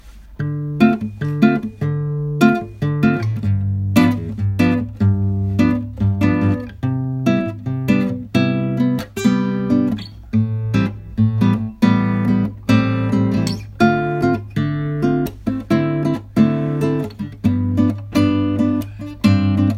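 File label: Yamaha CG122, klassicheskie gitary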